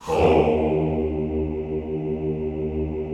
"houuu" D#1, classical choir; Best Service; Extended Classical Choir

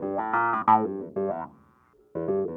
clavinet, analog funk

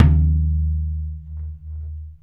dwooong, percussive

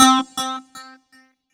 C4, SynthLine; 90 s Sample CDs; NiceBeats; SynthLine VOL-15

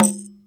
Tarine, EMU Orbit-3 drum machine; 300 Drum Machines

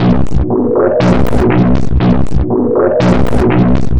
Synth Distorted; Electrocution

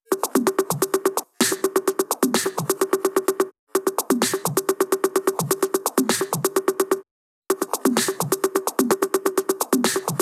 Drum Loop 128BPM Perc; VEC5; Drumloops; Multi Loops

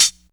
HAT; PlatinumPhattCD1; E-MU Producer Series Vol. 8 – Platinum Phatt

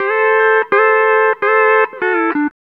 COUNTRY

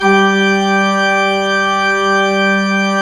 ORG P. ORG; Roland - LA Composer vol. 1

